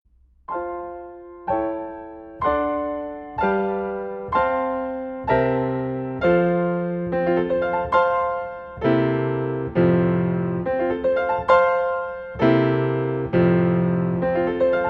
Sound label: head tum toes live piano accompaniment